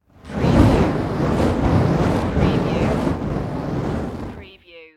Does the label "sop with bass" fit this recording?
No